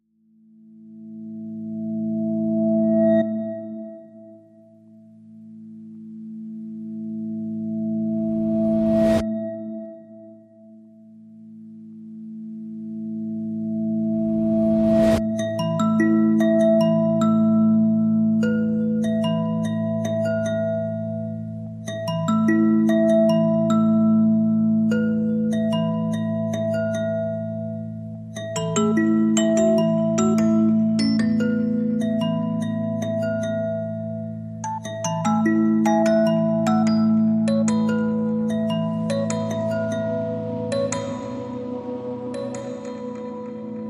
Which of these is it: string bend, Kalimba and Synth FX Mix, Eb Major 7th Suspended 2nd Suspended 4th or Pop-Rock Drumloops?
Kalimba and Synth FX Mix